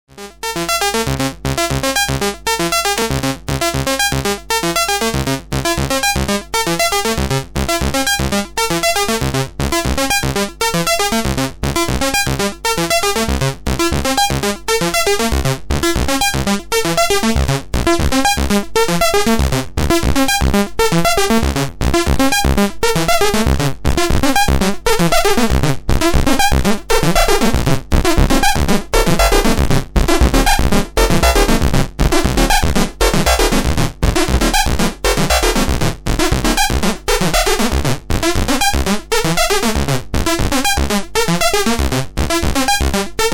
single saw

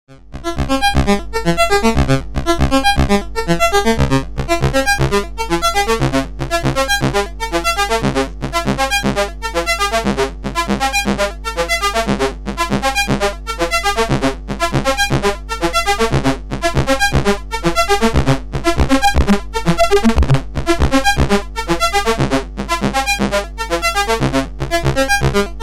single tri ADSR

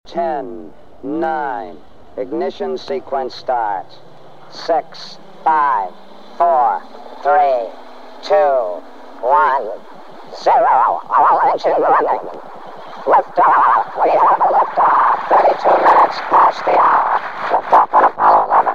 countdown LFO

